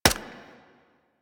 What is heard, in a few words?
Arch Long Rifle Mech, Weapons; Warframe; Archwing